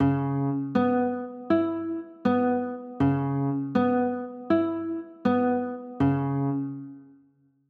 c sharp m7

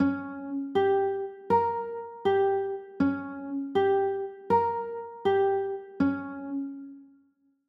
g dim, chords

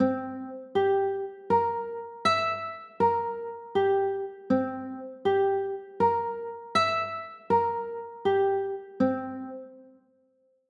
c 6, chords

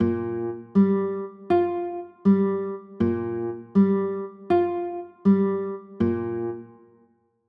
b flat 9, chords

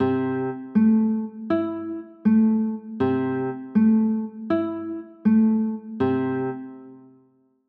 c 6, chords

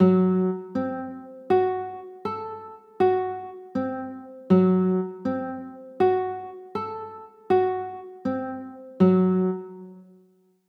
g flat dim, chords

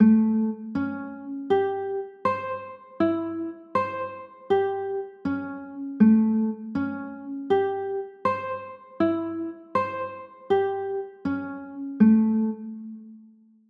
a sharp 6 9, chords

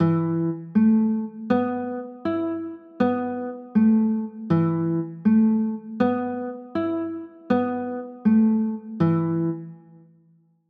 e sus4, chords

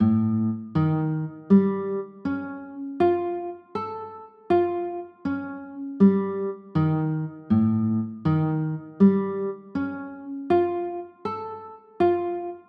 e flat 9b5, chords

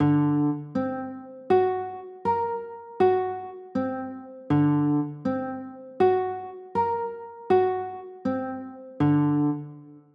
d 7 sharp 9, chords; d 7 sharp 5

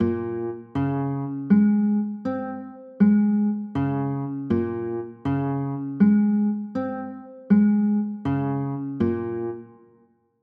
a sharp m9, chords

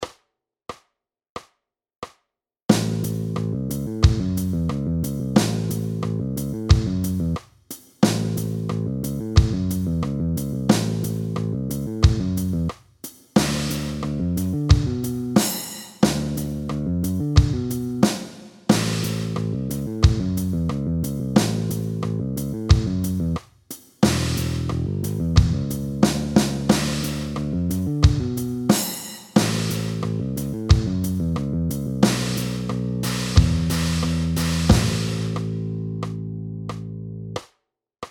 blues guitar riff backing track

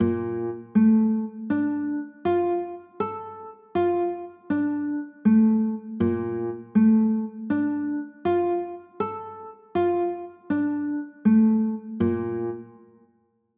b flat maj7

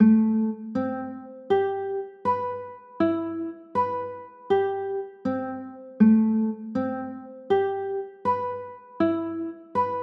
a m9, chords

